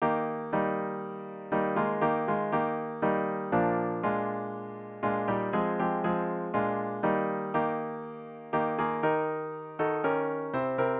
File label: midi